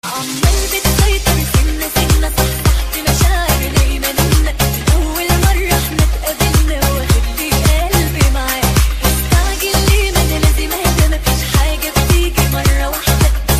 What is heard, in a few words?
Arabic ringtone